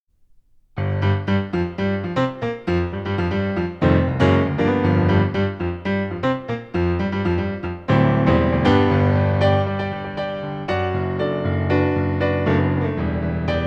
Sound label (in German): Yamaha P-255 test lang